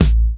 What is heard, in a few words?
snare one shot low bassy rolled; Sample Magic; Classic Chicago House; Drum Hits